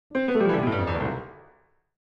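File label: Grand Piano Short Descending; keyboards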